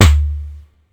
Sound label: Snare, Ensoniq ASR-X Pro